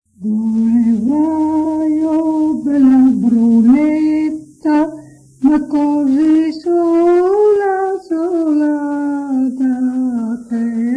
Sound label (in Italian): canto